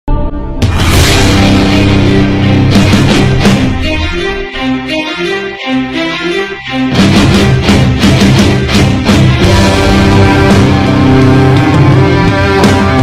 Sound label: Metal